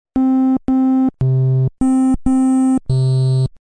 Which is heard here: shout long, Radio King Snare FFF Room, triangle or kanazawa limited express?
triangle